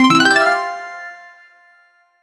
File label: game achievement sound